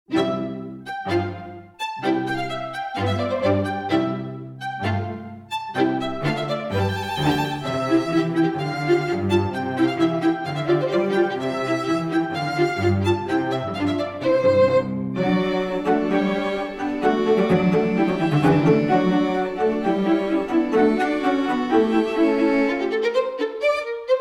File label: String Quartet remix; Instrumentals